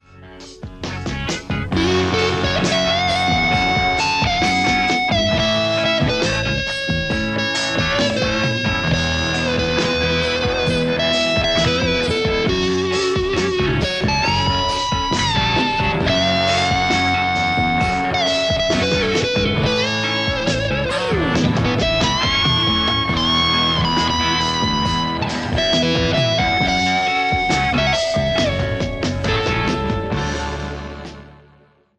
tele thunderverb200

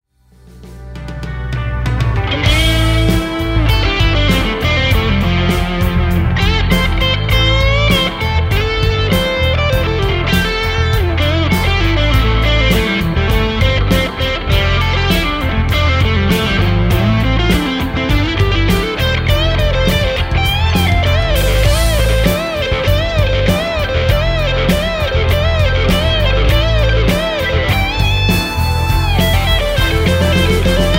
tele JCM800 hot; mercuriall

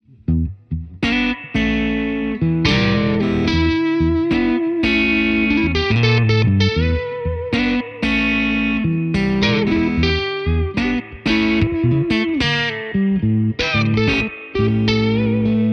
blues strat harlequin; mercuriall

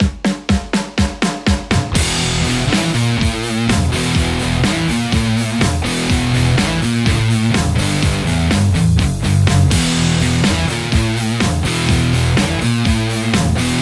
lespaul; mercuriall

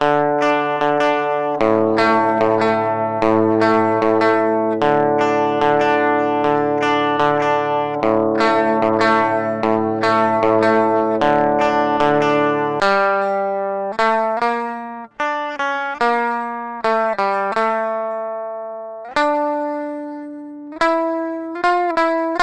rythm tube saturator

